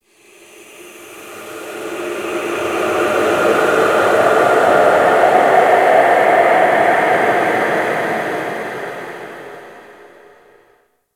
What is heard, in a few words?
Whisper; Warframe; Orokin